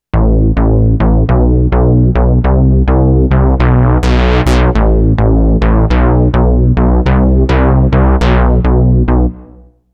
detune bass; novation peak test

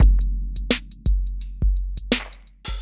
hip-hop drums swing

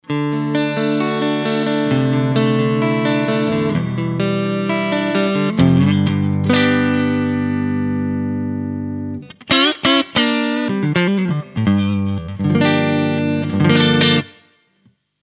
fernandes retrorocket pro neck

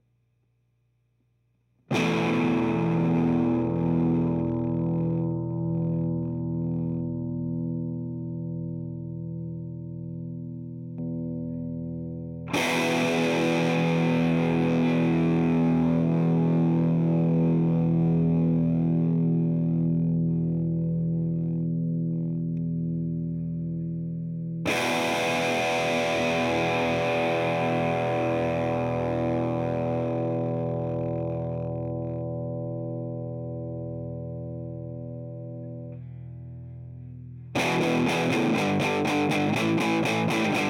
kma minos all mid position

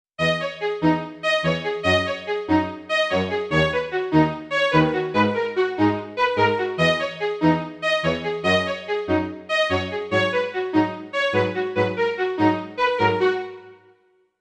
PX S5000 strings

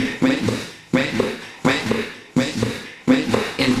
grooveassist 126

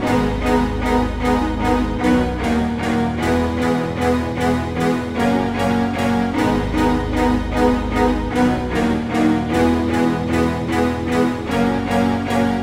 Bpm76 Gm String Synth Chords; 100 Million

